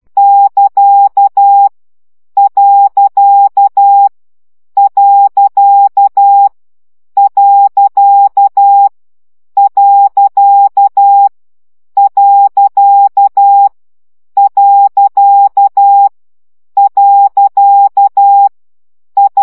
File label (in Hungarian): CW gyakorlas pont 10 baud